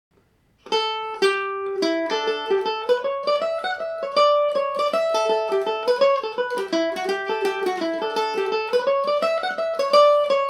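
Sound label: A mixolydian, Irish mandolin; little beggarman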